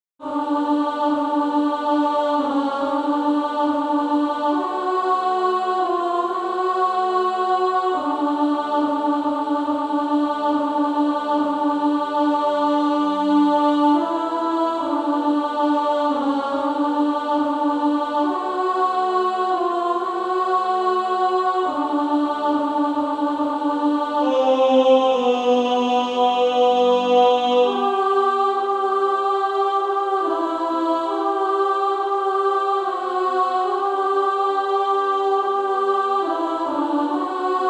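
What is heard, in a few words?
Female Choir, alto 1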